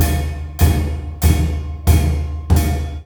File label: MALAY PHR L, Heart of Asia